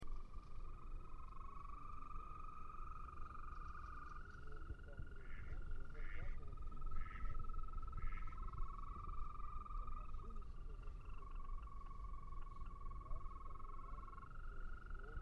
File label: croaking frogs